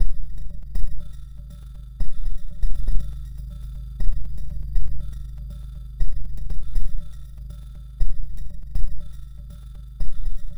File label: Glitched, Processed Percussion